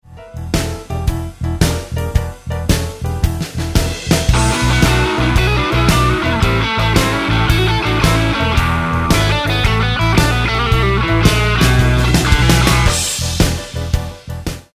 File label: Roadhouse Licks Lick, guitar; 20 Roadhouse Licks